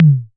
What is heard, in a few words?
Tom - Mid-Hi Tom, Wiard 300-Series Modular